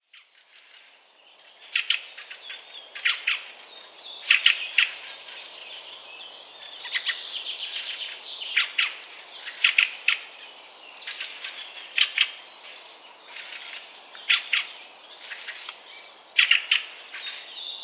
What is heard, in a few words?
fieldfare turdus pilaris; grtrost